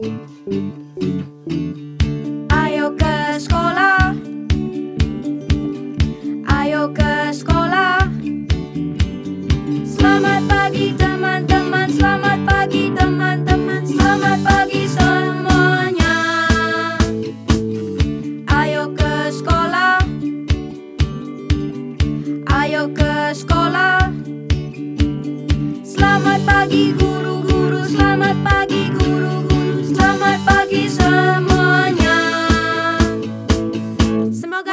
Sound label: song indonesian